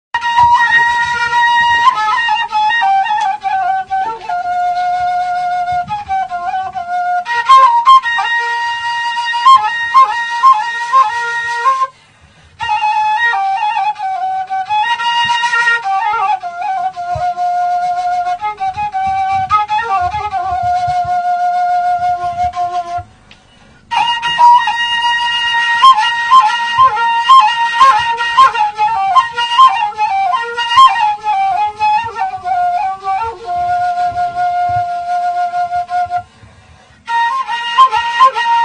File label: Ney Labak